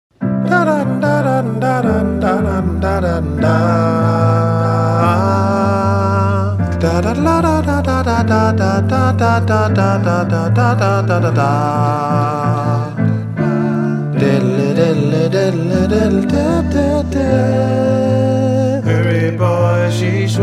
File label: coda sopran